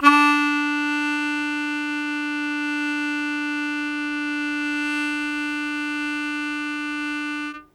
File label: Long Sustain D1, Harmonica; Zero G - Harmonica